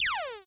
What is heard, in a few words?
laser; lasers